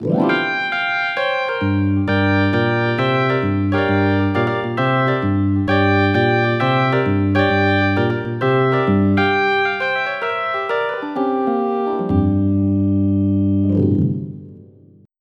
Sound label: hammond